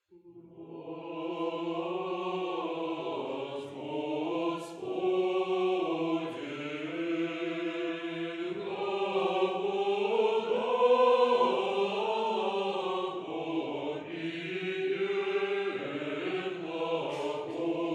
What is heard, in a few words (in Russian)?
Хор Лиговской старообрядческой общины. Смешанный состав. Санкт Петербург; Глас Господень